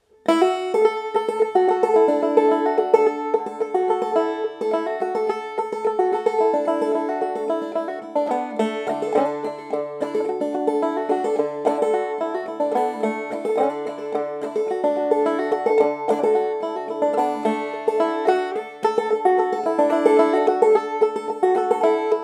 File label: Banjo D; Johnson Boys